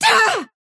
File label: pain big